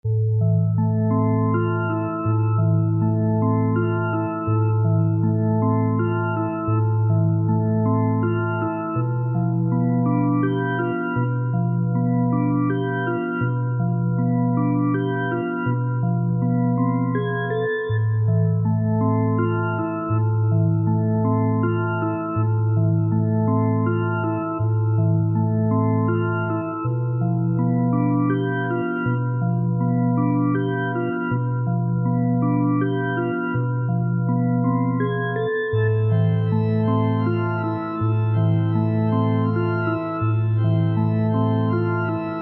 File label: prelude in sad minor